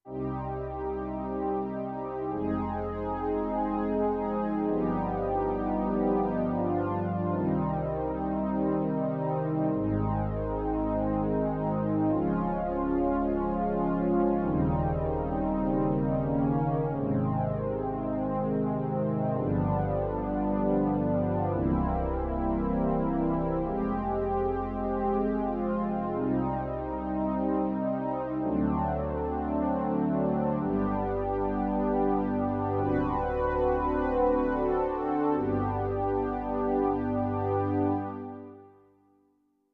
Thick Pad

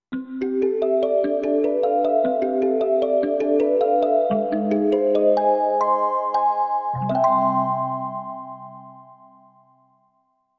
Gamelimba

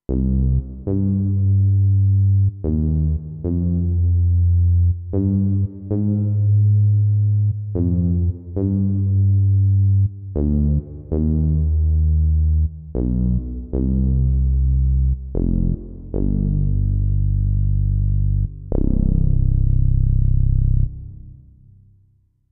Syn Bass Dark

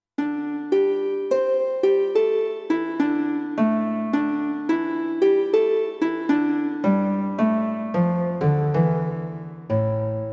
Gopichant

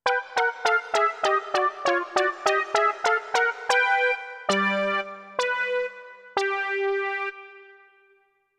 Quack Brass

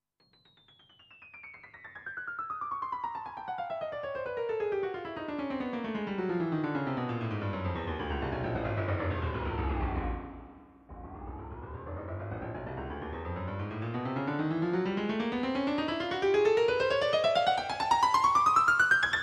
Bright Piano

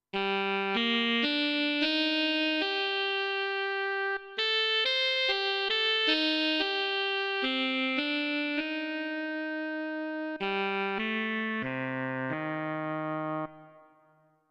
Tenor Sax

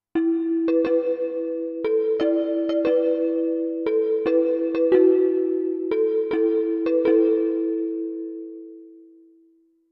Bonang

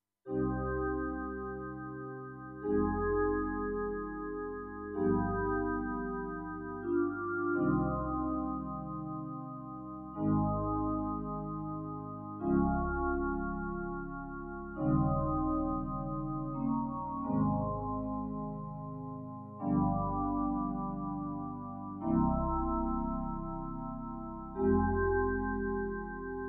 Bowed Pad

